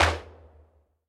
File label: Boss Clap Single; Winkydink